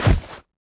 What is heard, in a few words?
knife push attack heavy; weapons; Counter Strike Model Pack; CS:GO